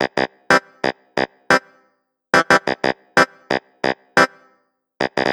Clavinet; клавинет

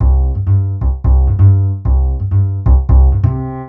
Short, Latin

